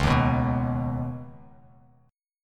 Db 7th Suspended 2nd, Bass Standard; JGuitar

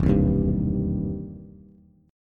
E Minor Major 7th Sharp 5th, E, down, Bass; EmM7#5 strummed

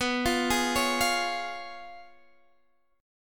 C sharp 7th B

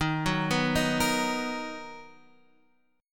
B Major 7th Eb

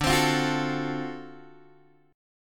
D 7th Sharp 9th, Guitar Open G

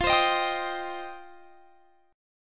F 7th Suspended 2nd F down; F7sus2; strummed

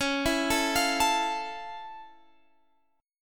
F Augmented Major 7th C sharp

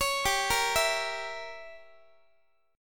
G Diminished 7th, Guitar; Gdim7 arpeggiated; x,x,16,17,14,15

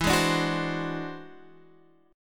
E Augmented 9th E

